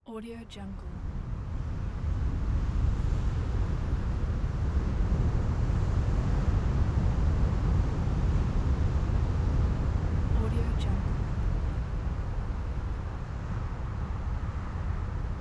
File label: desert